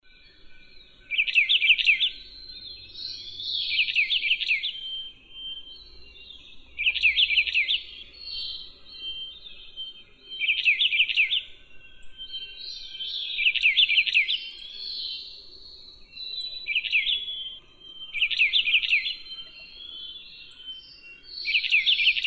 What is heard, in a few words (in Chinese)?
褐鷽; 褐灰雀; 雀科/留鳥